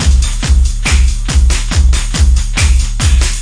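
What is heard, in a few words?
140 bpm, tribal big techno, drum loops and breaks; 131 to 140 bpm; samples-ghost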